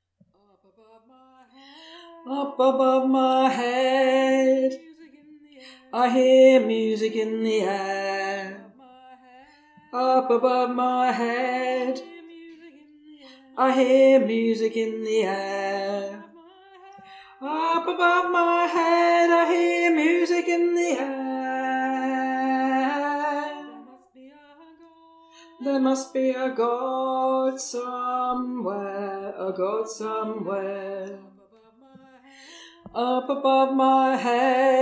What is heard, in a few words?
up above my head tenor